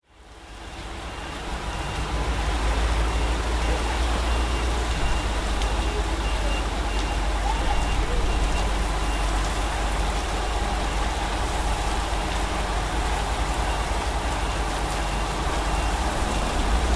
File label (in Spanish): calles lluvia; sarmiento y rero